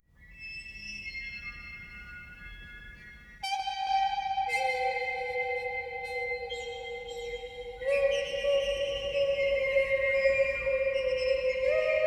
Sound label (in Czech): hvizdy s postolkami; soundscape; Agosto Foundation :: Hermit I.